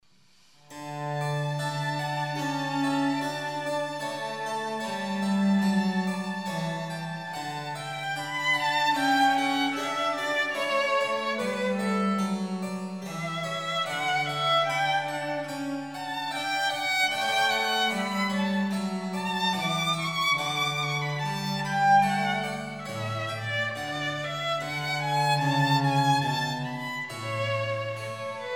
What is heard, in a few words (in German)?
Sonate h-moll